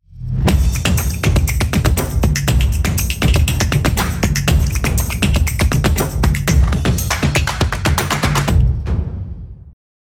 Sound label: Hype percussion ident logo podcast; logo animation